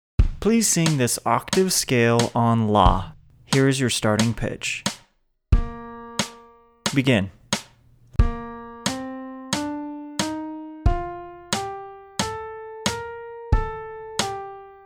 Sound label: General Audition Unchanged Male Voice